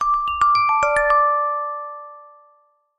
multimedia alert chime short musical notification error; zapsplat